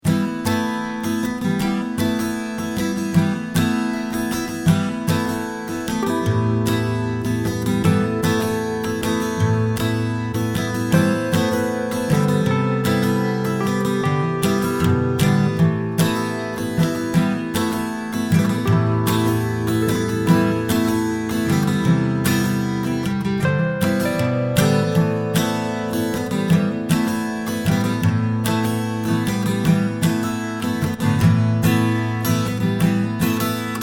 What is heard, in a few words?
Wurly